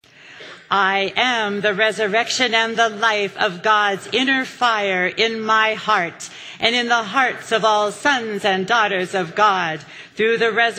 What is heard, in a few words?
Mantra; Easter Meditation Ritual